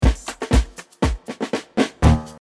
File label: Instrumental